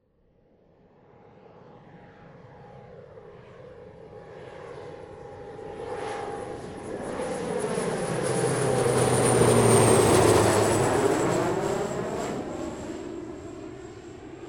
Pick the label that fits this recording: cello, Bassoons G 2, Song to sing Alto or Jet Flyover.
Jet Flyover